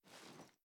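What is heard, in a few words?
assault rifle reload empty elbow; weapons; gmod; viper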